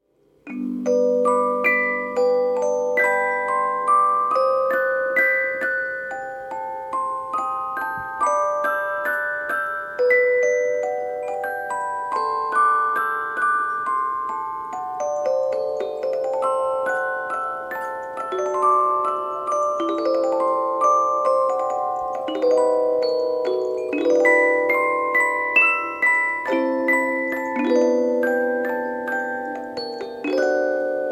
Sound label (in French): reuge clair de lune, boites musique